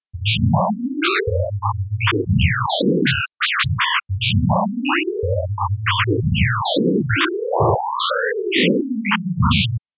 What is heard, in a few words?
bonus signal